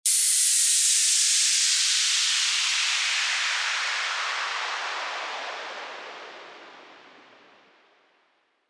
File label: WHOOSH